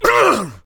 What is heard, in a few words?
Inmate Fight Pain